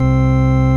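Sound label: ORG Vox, Continental organ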